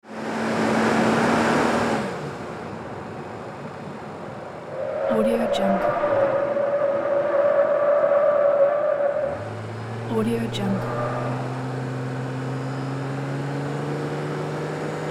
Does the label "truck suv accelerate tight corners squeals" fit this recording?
Yes